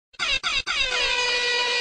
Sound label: Message Nokia